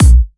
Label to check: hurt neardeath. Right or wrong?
Wrong